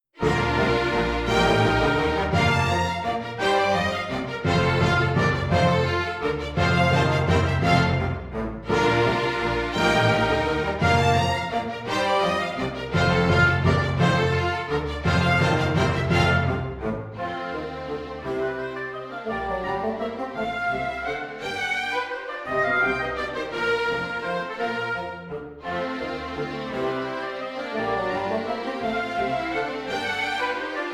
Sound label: Menuett orch; Beethoven; Menuett 1801 Hess 331; A beautiful menuetto sketch from 1801